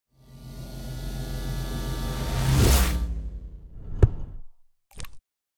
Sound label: Intro Sound; Sicky Stone Studio